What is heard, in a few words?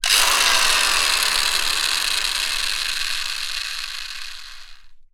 industrial tools drill electric short burst freewheeling; sound effects one